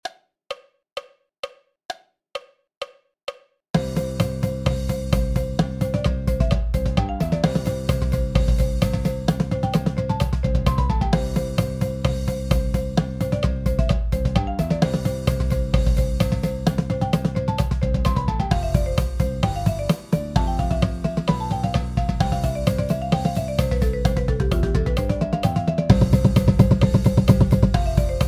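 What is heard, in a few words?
130 BPM Paradiddle Breakdown with countoff, Marching Percussion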